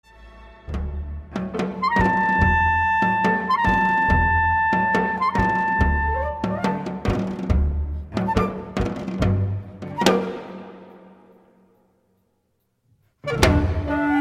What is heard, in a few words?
Tango